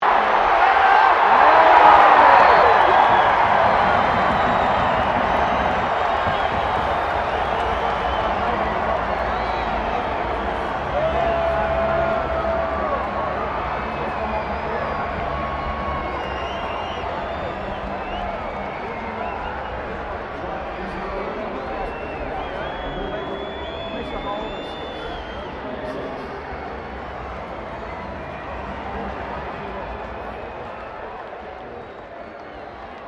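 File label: crowd 15K Rugby World Cup cheer high, Rugby world cup game; Crowd Sound Effects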